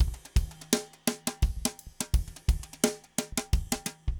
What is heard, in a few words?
funk 6 4 rods 170; odd loops